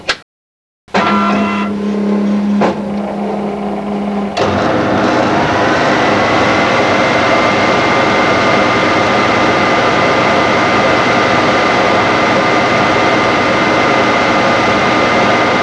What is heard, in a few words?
COP ON